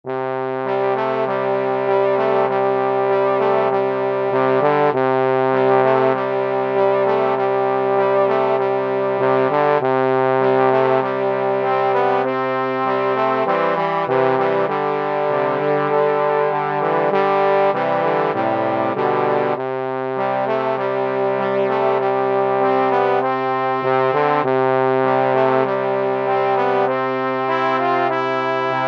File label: short opening for 3 trombones